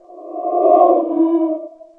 skinwalker goatman alert; slender skinwalker

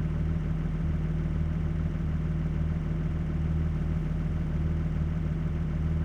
idle, nissan skyline gtr r34; cyn-cars

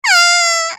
pain severe; voice packs

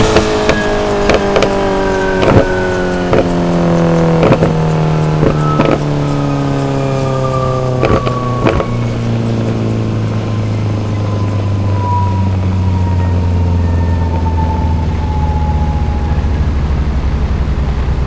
revdown; jopolestar; nfs heat ganimator pack

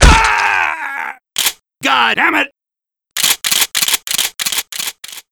fail; neon inferno; painiscupcake

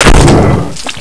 music box despawn; zerosiki; eltra

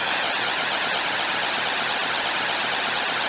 shell blue warning; tf2ware ultimate; mariokart

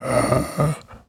idle, male zombie; nmrih; zerosiki; slender

